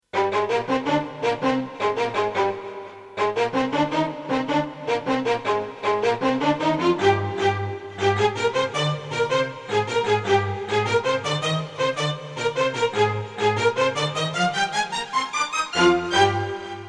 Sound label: Staccato Orch; korg; EXB Solo Instrumente